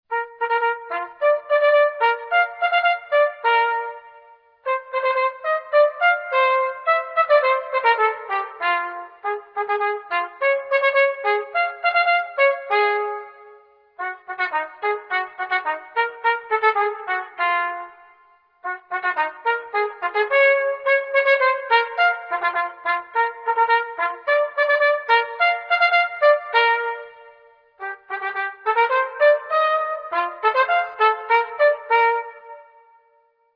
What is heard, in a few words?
trumpet; Arban